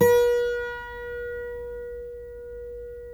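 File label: GUILD 12-string, Guitars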